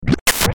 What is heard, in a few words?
Glitch Blurp Static Burst; glitches; Blastwave